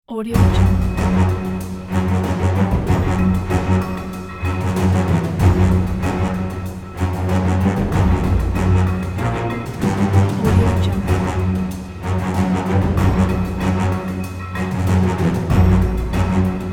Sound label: middle east orchestral action